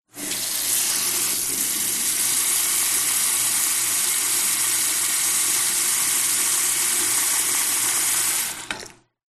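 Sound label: Bathroom Faucet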